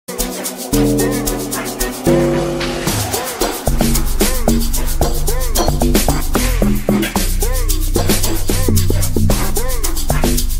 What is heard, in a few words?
Zulu Drums